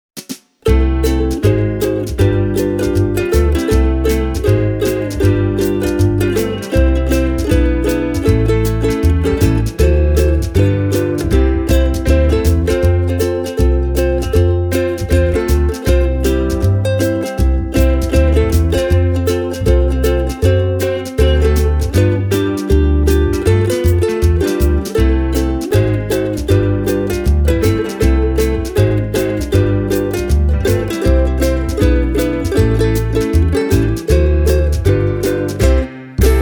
Baton Rouge V1 S Royal

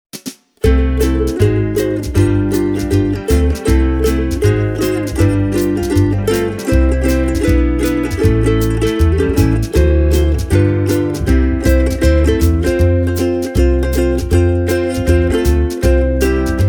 Martin 0X Bamboo

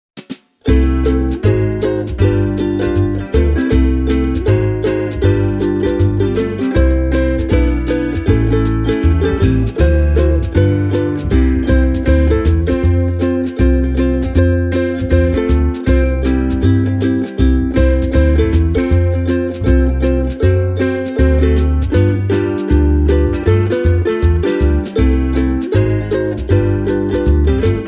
Tanglewood TU 1

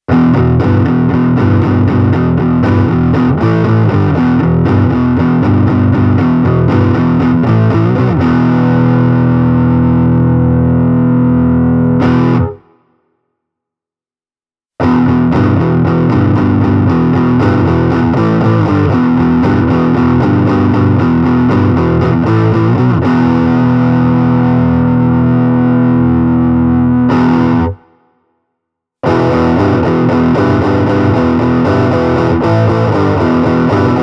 Epiphone Ltd Ed Korina Flying V distorted

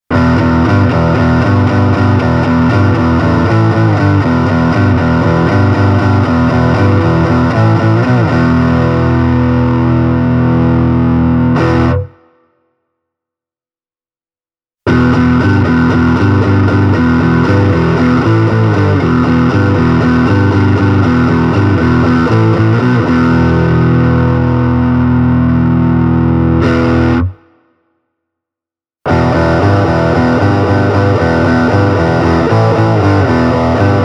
LTD M 100FM distorted

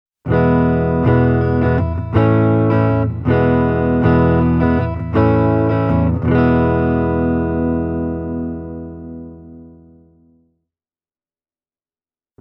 Epiphone Ltd Ed Korina Explorer clean

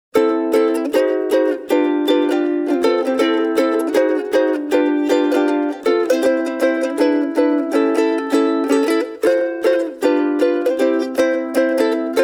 Ortega RFU 10S solo

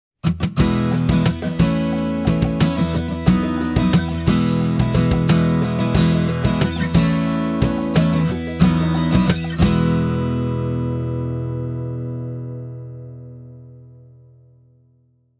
Westerngitarren Yamaha FG800M; Rockway

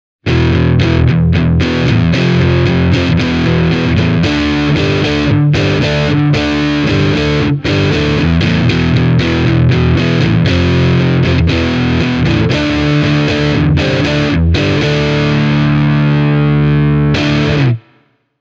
Boss Katana 50 overdriven

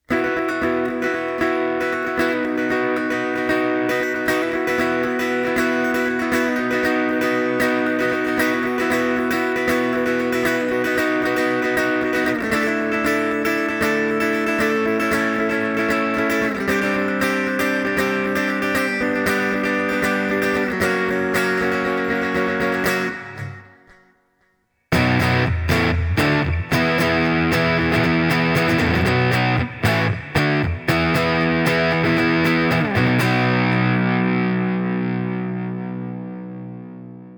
Italia Mondial Classic live doubling guitars only; puoliakustinen sähkökitara

